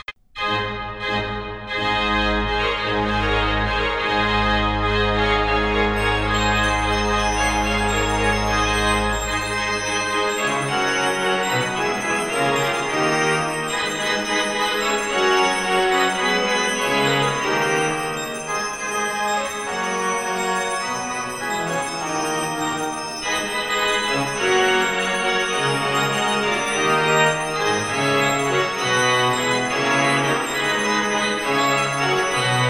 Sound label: Improvisation